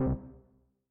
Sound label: combo break